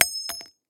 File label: Shell heavy carpet; STALKER; IWP